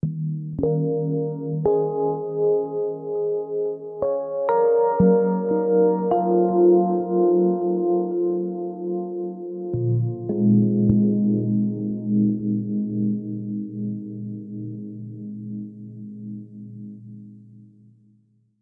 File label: reflective musical bells atmosphere; WORLD